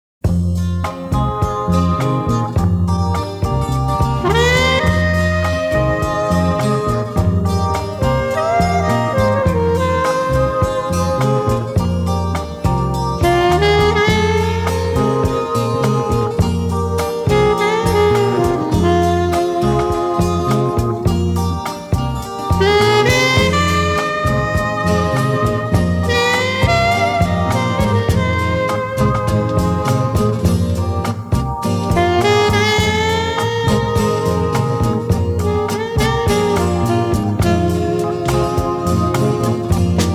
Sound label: saxy